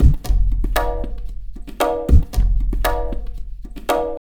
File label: Tabla, 115 BPM; Zero-G; Pure Tabla